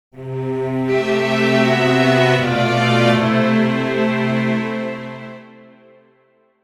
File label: Passing, altered chords; altered iv